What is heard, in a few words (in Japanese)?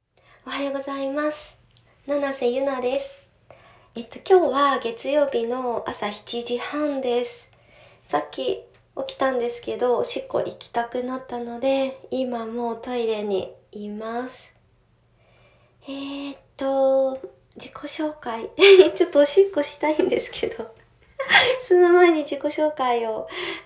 寝起きおしっこ